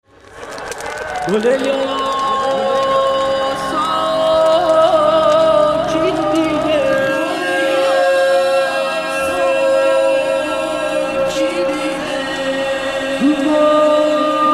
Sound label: vocal